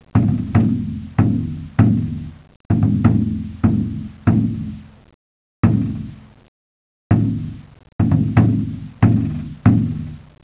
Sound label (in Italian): tamburo